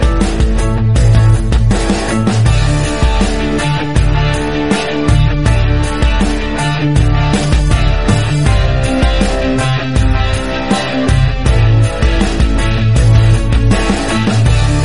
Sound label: guitar rock